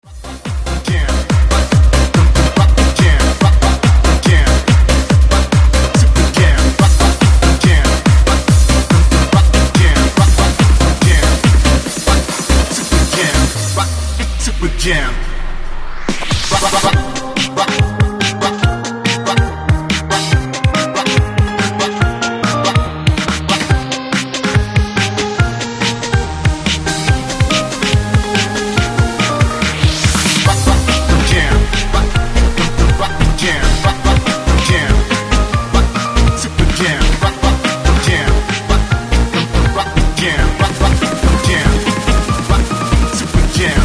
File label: BASS Funky